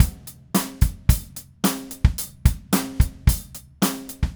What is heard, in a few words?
Red Maple Groove 110, DW Red Maple Kit